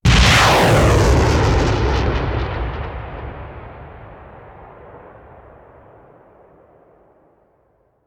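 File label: Artillery Missile Launcher; Sound Ideas; Guns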